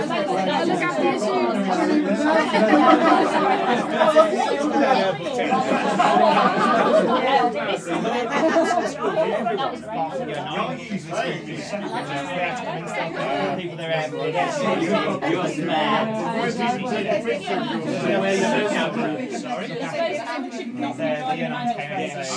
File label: sounds of a party